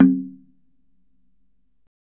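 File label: TUNG LO, Tongue Drums, Pitched Percussion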